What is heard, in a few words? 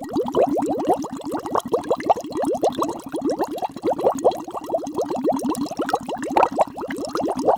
Bubbling; baseq2